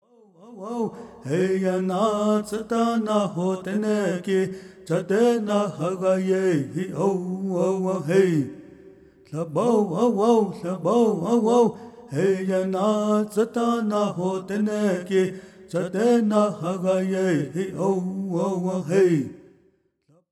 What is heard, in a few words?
Antelope Song